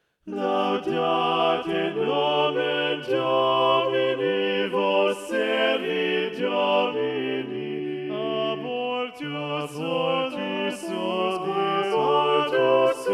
balanced voices; laudate; schola

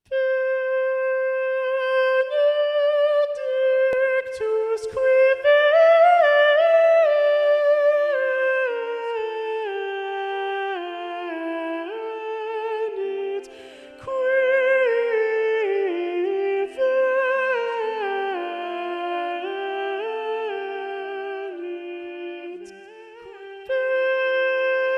soprano predominant; palestrina; missa brevis benedictus; schola

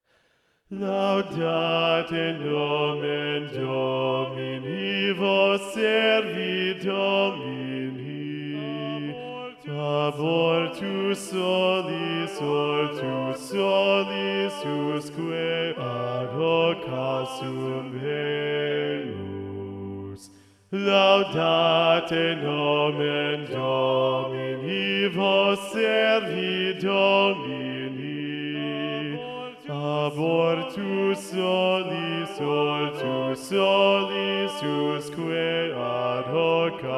bass predominant; laudate; schola

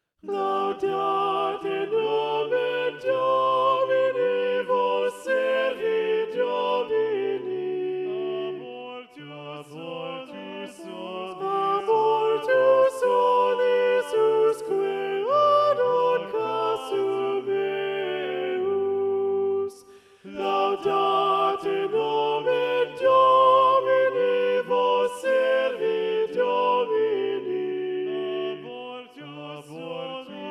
laudate soprano predominant, schola

